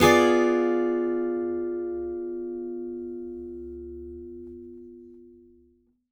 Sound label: ACCGTR DIMCD, Whole Lotta Country